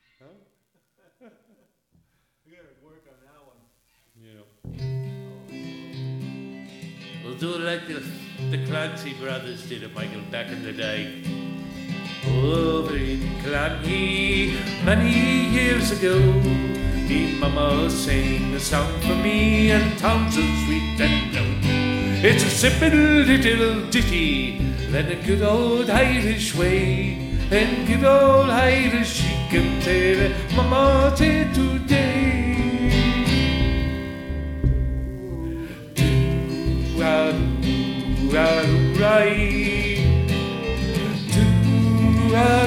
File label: Irish Lullaby